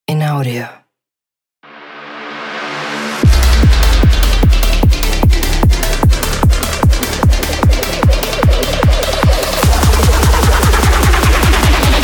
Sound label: Trap Power; Oddvision Media; Infraction Music